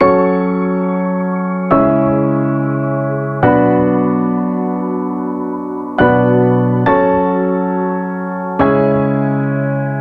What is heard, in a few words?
Dark Chords A Maj F Min 70 BPM, piano; nikos piano sample pack